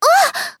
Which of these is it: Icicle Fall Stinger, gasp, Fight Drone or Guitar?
gasp